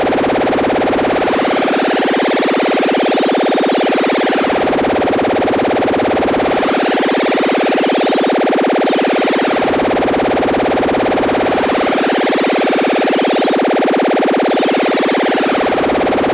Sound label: RealModem sweep